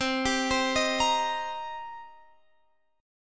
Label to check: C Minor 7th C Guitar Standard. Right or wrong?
Right